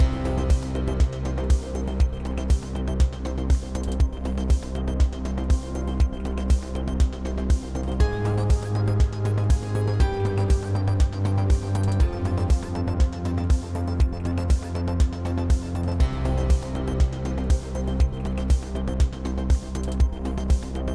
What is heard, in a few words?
Downtown Dash 8CH 120BPM